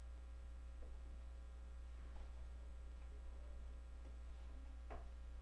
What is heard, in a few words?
camera